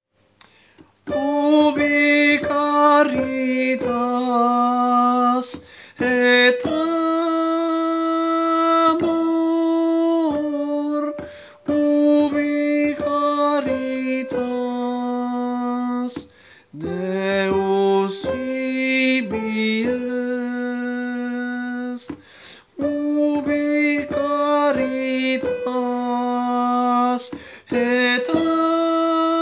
Tenori, coro; Coro Isola del Giglio; Giovedi Santo; Ubi Caritas

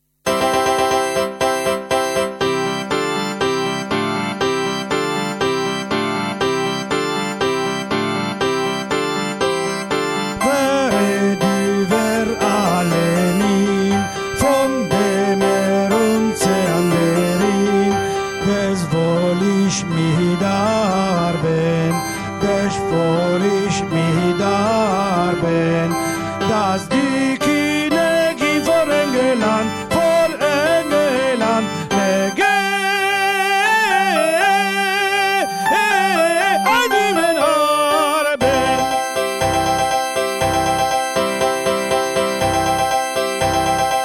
tenori; Carmina; Were diu werlt alle min

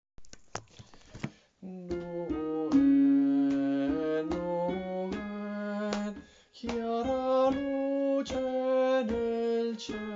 Noel soprani, coro

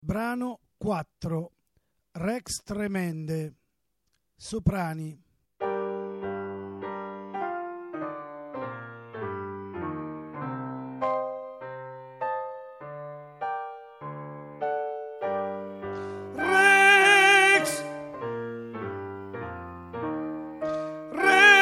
rex tremendae soprani; Requiem

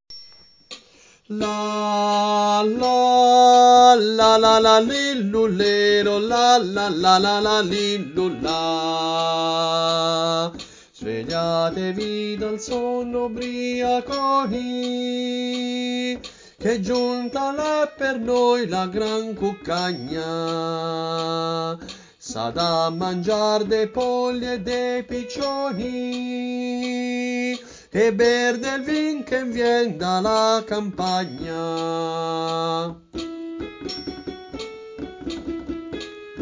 Soprani, Coro; Coro Isola del Giglio; Il Trescone

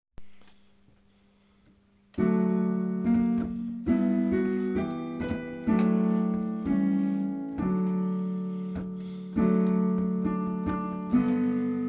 bassi, coro; Coro Isola del Giglio

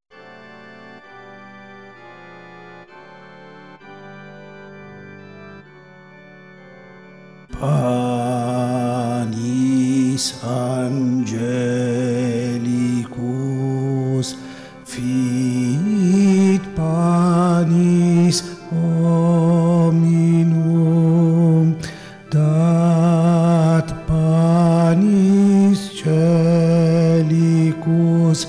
panis contralti coro; Panis Angelicus

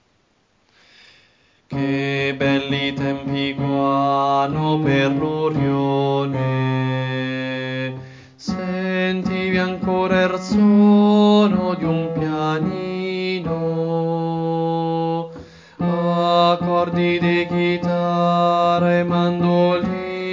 bassi, coro

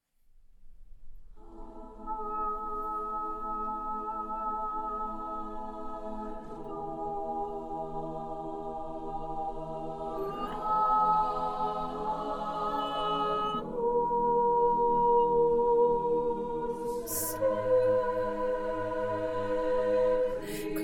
Adoramus te Soprani, coro

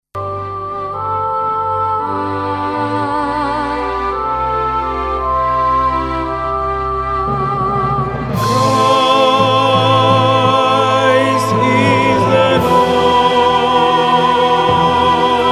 tenori

